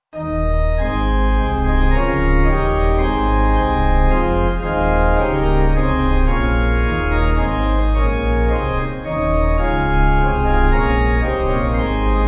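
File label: Pipe Organ, Music Backing Files for Churches; Come Praise The Name; Stand Up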